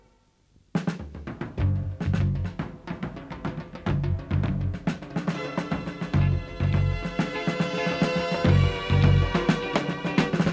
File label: Unknown Instrumental